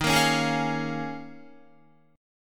Eb 7th Suspended 2nd Suspended 4th Eb, Guitar; Eb7sus2sus4; strummed; JGuitar

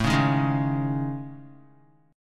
A Major 7th Flat 5th, Guitar, chord; AM7b5 strummed; 4,4,3,1,1,x; JGuitar

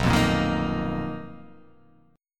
B Major 7th Flat 5th B down, chord shape

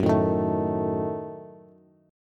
Gb-Minor 6th, Bass; strummed; JGuitar

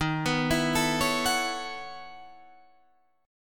B 11th Eb, chord

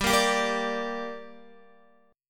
G Minor G down Guitar, chord; strummed; JGuitar

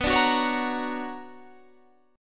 B Minor Major 9th B Guitar Standard, chord; BmM9 strummed; JGuitar

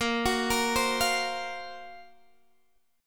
Gb Major 7th Flat 5th

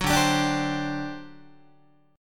D 11th D, down, Guitar; D11 strummed; JGuitar

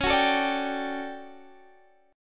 D Major 11th C sharp

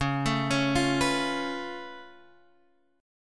Db Augmented 7th Db down Guitar Open G

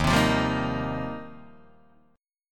Eb 6th Add 9th, Guitar, Open D

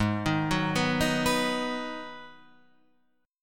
G sharp Minor 7th, Guitar Half Step Down

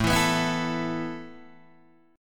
A Suspended 2nd A Guitar DADGAD

